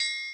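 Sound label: triangle; cubic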